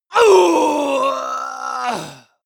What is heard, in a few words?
male combat die